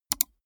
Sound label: gaming mouse left click single fast soft